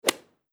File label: Golf Hit; iMovie Sound Effects